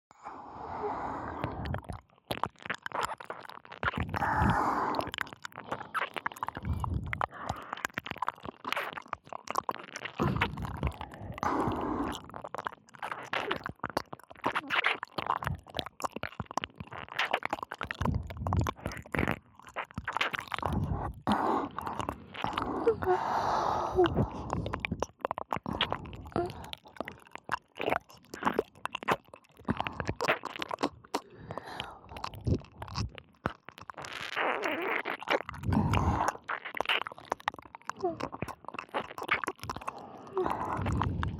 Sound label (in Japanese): 両耳舐めループ; 反転特典1.両耳舐めループ